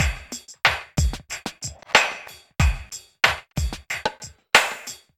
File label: Beats, Synchro Funk; Finky Five; Sonic Foundry (Sony Creative Software)